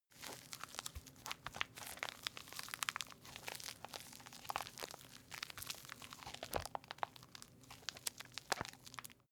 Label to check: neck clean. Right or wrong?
Wrong